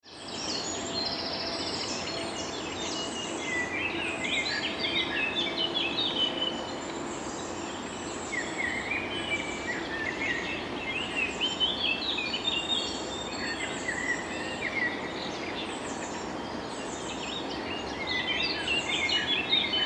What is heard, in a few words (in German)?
ufer voegel